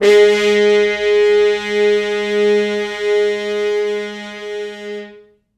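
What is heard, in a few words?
French Horns stops, Quantum Leap Horns Sax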